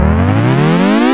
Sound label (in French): SIRENE; LEDWARS